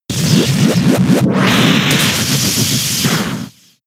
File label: Zap Cannon; pokemon sfx gen 6 attack moves xy oras